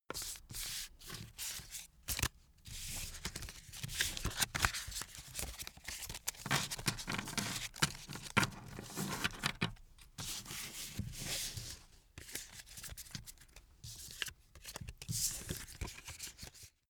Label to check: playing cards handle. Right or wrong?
Right